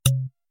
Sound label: Deselect